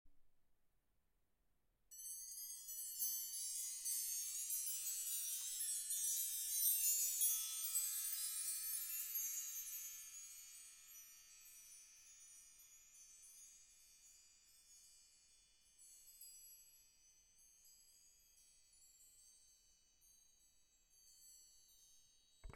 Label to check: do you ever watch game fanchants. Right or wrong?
Wrong